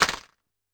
D6 Applause Short, Yamaha PSR-E323 Sound Effect Kit